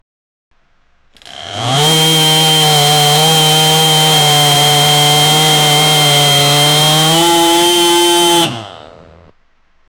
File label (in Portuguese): cortar um morango com uma; fruits